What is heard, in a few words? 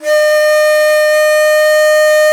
BON DI, Winds, Old World Instruments